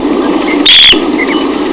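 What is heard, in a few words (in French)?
bécasseau variable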